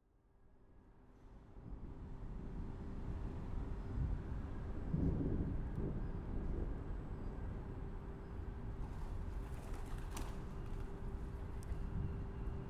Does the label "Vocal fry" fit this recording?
No